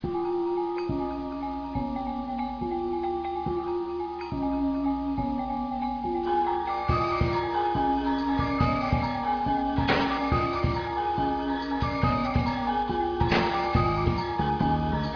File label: gamelan